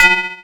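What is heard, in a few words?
synth drum; X-Static Goldmine 1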